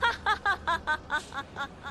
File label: hahahaha